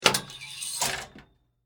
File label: Hanger Hang Shirt, Household, Hanger, Hang, Shirt, Hotel, Closet